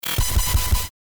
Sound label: Glitch